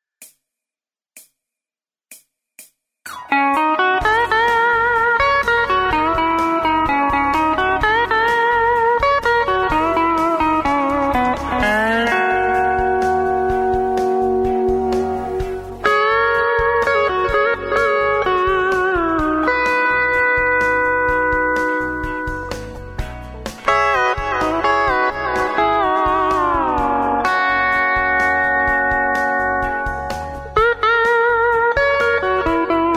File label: steel